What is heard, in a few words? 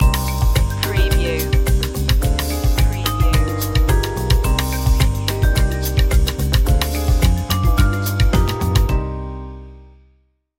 summer lounge stinger